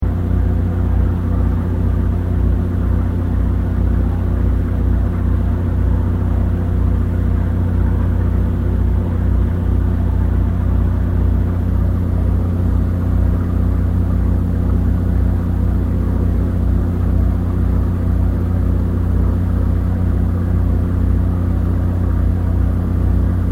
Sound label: BOAT TOURIST FERRY MOVING VERY FAST LOOP; Boats; Vehicles